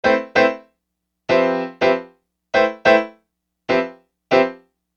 Acoustic Piano; Construction Kit; HIP-HOP; Sonic Foundry (Sony Creative Software)

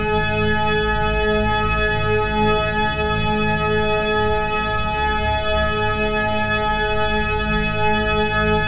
CLT LOW, CELTIC PAD; Bolder Sounds - Granular

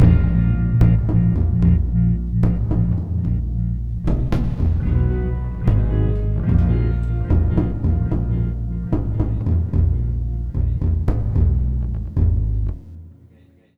Full Mix A#, Lounge; Zero-G Kocktail Kollection; Construction Kitz (Lounge); Volcano at Sunrise 074

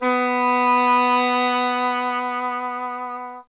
WND, Solo Instruments; E.HRN; Miroslav Vitous - Solo Instruments VOL-2

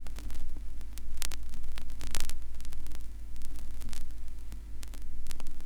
NOISE; Xsample VOL-9

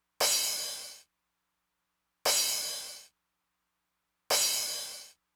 Crash, Roland TR-909; Roland TR-909 Ableton Project

